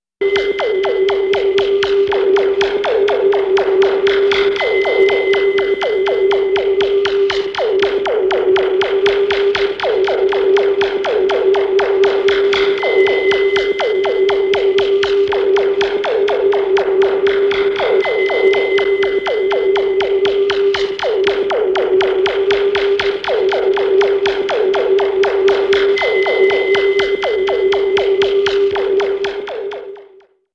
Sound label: Sci-Fi Machine Going Crazy; horror sounds; Hollywood Edge